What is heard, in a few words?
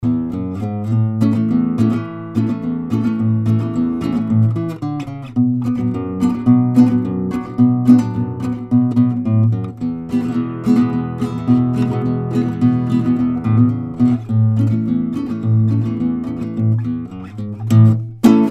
cheap nylon string guitar; crystal